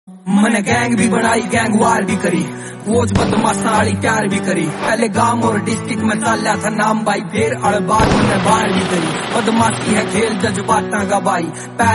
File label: rap